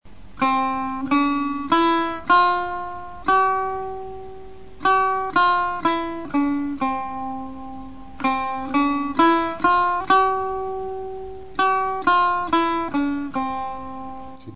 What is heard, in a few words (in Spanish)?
chahargah escala, Musica persa; muye